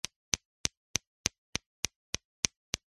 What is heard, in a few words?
industrial hammer tiny wooden hits stone; zapsplat